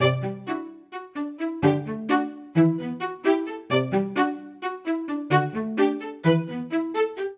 Phrase Yearning I-II-iii C Major 130 bpm, String Quartet; MIDI Clips; Ableton; Factory Packs; Spitfire Audio